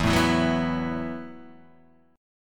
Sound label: E Suspended 2nd Suspended 4th E, down; strummed; JGuitar